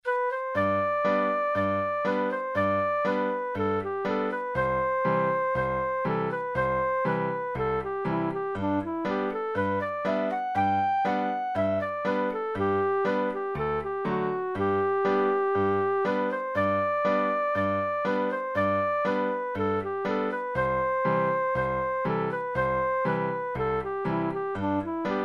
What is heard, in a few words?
Hollow Poplar 60bpm